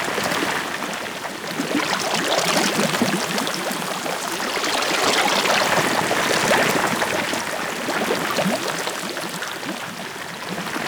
Coolant Lake Loop; Props; Loops; Warframe; Venus Open World; Venus Landscape

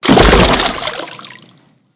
Fall Water, Foley; Characters; Tenno

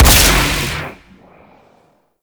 Amplifier Barrel Fire; Weapons; Operators Amp Barrel Part B Fire A; Warframe; Amplifiers

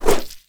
Pool Noodle Whoosh

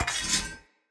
Equip, Swords; Weapons; Player; Mockery Weapon Common

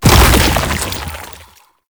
Rolling Rot Proj Explosion; Enemies; Abilities; Warframe; Proto Infested Babau

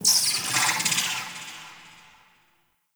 Sentient Lair Exterior Creature

